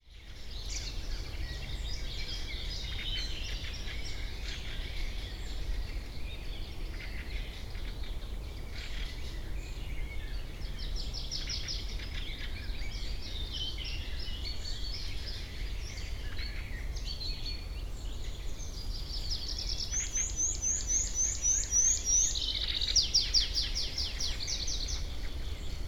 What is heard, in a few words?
soundwalk, sergiyevposad